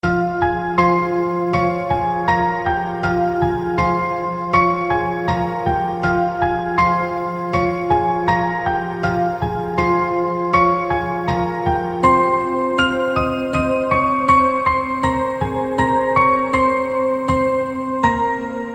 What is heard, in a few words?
Bollywood Music Ringtone; ringtones